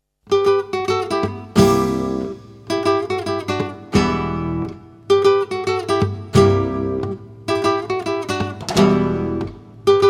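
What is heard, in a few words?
rumba, flamenco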